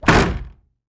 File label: Attic Closet Door Clo